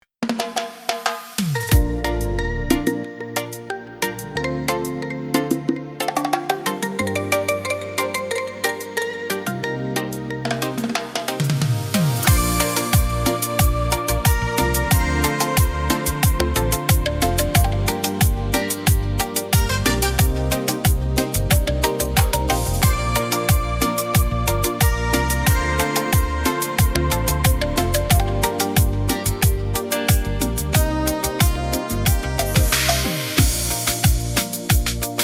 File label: saxophone B-dur, Laid Back reggae